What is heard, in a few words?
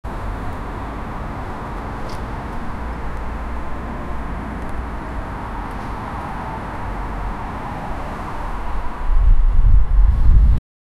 the sounds of the evening, busy